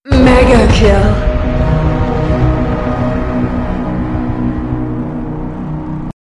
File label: music stinger; killstreak music; UT2K4